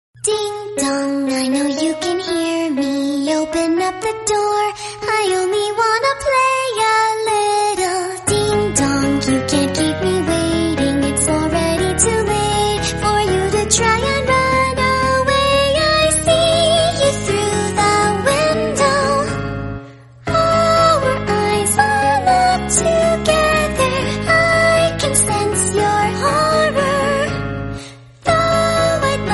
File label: hide and seek song, english